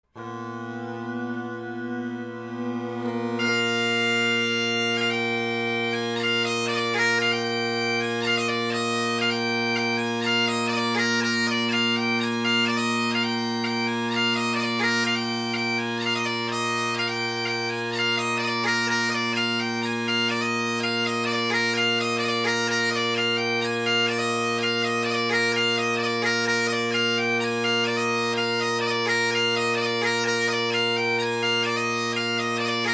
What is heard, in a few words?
polkas, bagpipe; (probably 1960s) Sinclair bagpipes